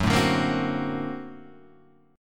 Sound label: A sharp Augmented Major 9th F sharp